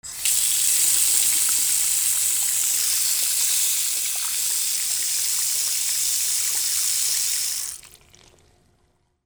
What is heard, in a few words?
BATHROOM